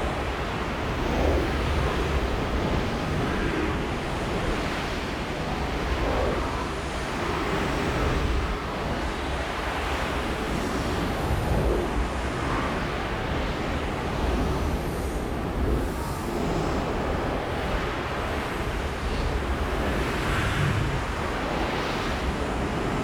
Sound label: AMB; DEMACIA